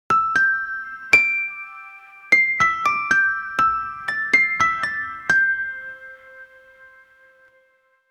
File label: gentle and reflective, children's